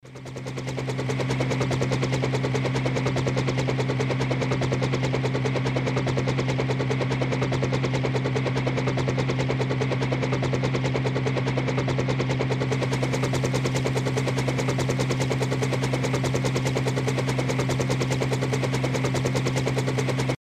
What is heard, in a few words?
Helicopter